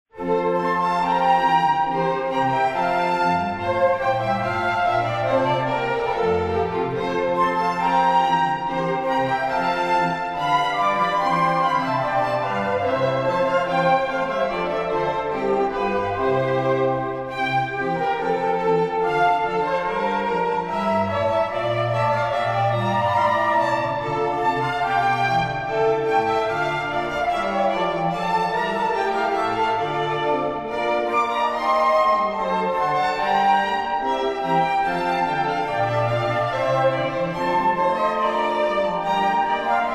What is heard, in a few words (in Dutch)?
gavotte uit ouverture no 3